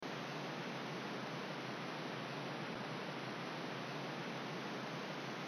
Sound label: rain